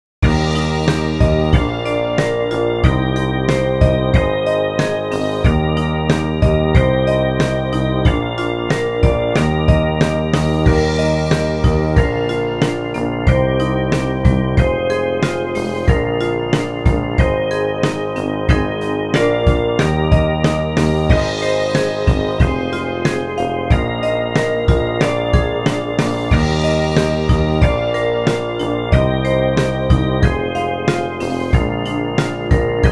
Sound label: Eb C Scale Study